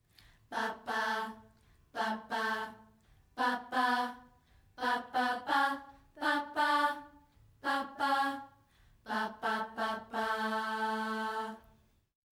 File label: Oh Happy Birthday Lower